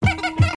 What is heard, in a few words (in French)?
pouet-pouet; Personnages auxiliaires